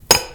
can

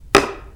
pet bottle table

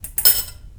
fork

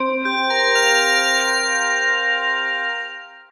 logo

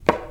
cup table

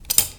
tongs

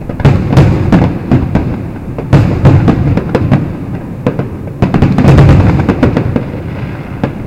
fireworks loop

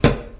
pot

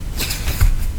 book

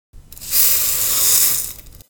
rice

